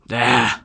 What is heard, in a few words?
AHH